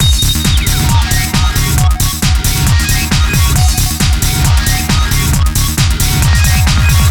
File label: groovebox